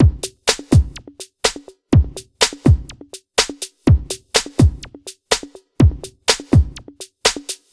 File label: Special Stomp, Drums Beats